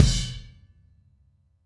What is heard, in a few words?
Beat Ending; Sonic Foundry (Sony Creative Software); Indie Rock Anthems